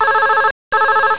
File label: Ring In